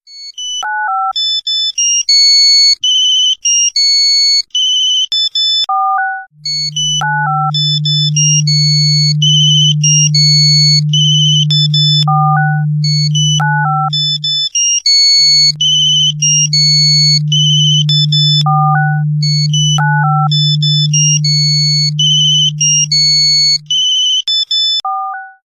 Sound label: ALARM Nokia Lumia 1520